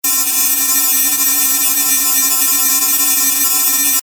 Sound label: rough distortion